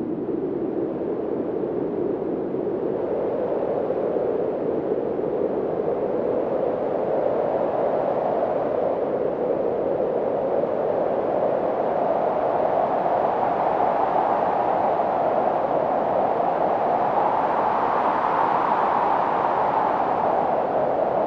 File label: wind; simple-weather